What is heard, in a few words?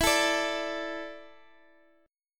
E 7th Suspended 4th E down Guitar DADGAD